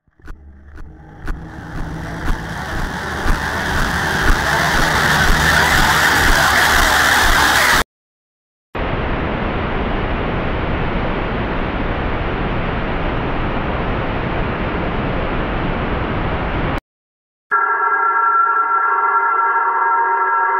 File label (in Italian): suoni horror ecco i suoni che fanno paura sound horror scary bhoot sound; effetti sonori